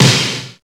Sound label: Snare, drum hits; Rose; Nu Dance Electro